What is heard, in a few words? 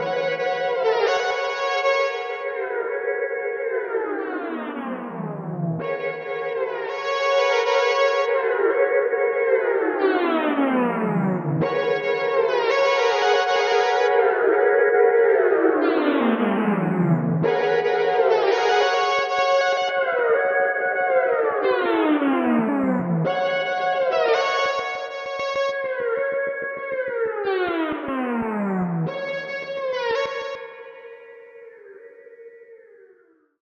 Strymon Magneto Tape Control w Step Sequencer